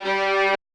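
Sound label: violin quartet F G2; AKAI Advance Orchestra Upgrade 97 Vol.1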